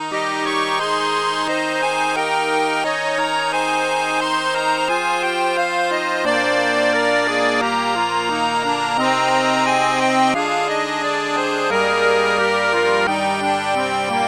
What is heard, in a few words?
pump reed organ